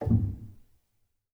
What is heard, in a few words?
rel, Grand Piano; bitKlavier Grand; Piano Bar